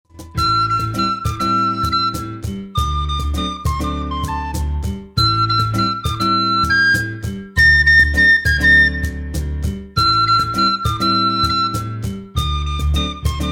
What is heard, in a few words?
Flute